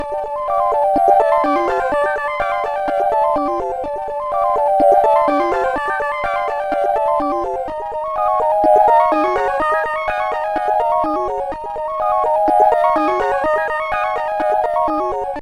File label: eastern light; MusicLine